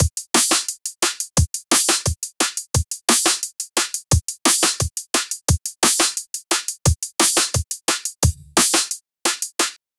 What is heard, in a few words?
drum loop in drum, drum bass